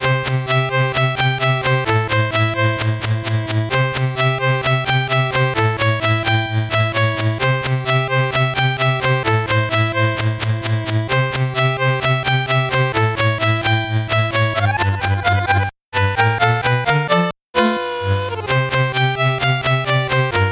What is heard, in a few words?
8bit bgm fast